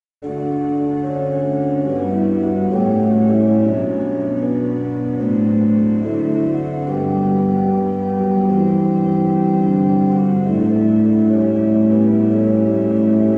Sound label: Barka